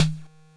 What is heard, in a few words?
Drums, Hits; DCM8